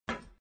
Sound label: metal